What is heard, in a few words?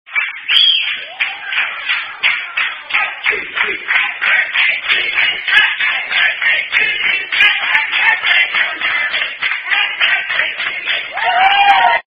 Birthday, Happy Birthday (Standard); Chili's